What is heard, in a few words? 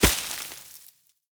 foliage impact bullet; physics